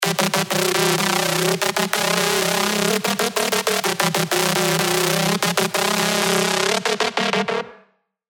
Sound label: LD, serum 2 bass tech house; Slave 2 The Rave; revealed serum 2 bass tech house vol 1